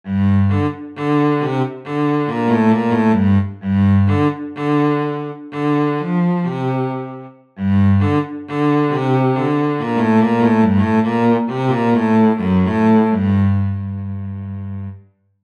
Wiolonczela

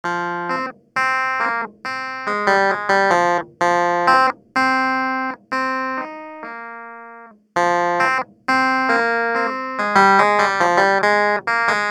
Klawikord

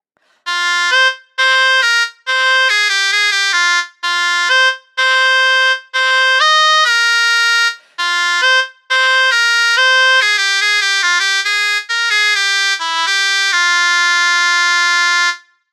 Aulos

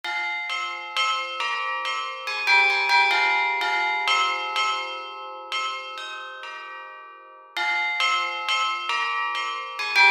Dzwony rurowe

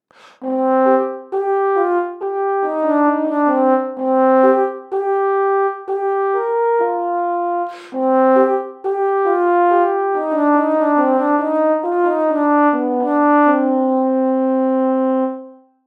Puzon

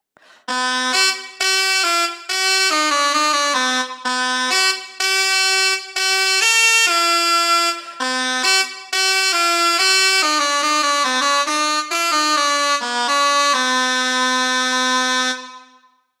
Pomort

